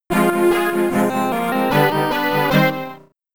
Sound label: Wasteland Jingle; Ultimate Pinball Quest; Amiga